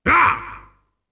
ATTACK; UFC Tapout; XBOX

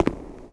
footstep subway

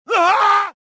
choke; rebel

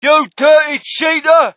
crowd, shout; Mike Tyson Heavyweight Boxing

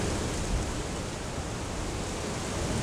wind trees; ambience; Soldier Of Fortune II Double Helix; XBOX; kamchatka

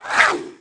Mutant Quick Attack; Valleakan Mutant 2; Aliens

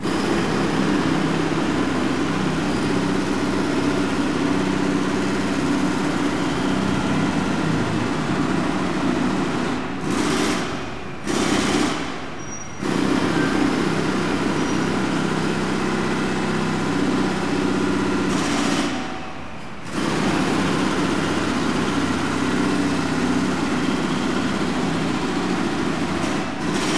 city roadworks, Ambient; Project Gotham Racing

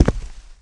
Walk Concrete; Player; Footsteps

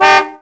auto large car horn

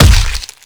hit blood, skater; Tony Hawk's Project 8; XBOX

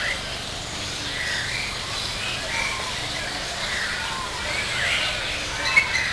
Forest

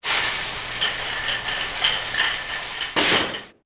cart; Soldier Of Fortune II Double Helix; XBOX

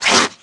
Small Spear Tail, Aliens; Small Spearleg

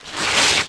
shops poster tear; Tony Hawk's Project 8; XBOX

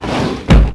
Wooden Desk Drawer Close; Max Payne

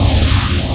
Sonic Loop, Sonic Gun

